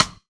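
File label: Lo-Bit Stick, Rimshots, Roland BK-5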